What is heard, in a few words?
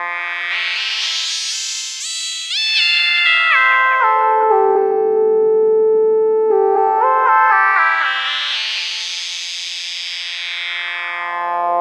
Synth, Modular Electronica; Sonic Foundry (Sony Creative Software); TSS Autopilot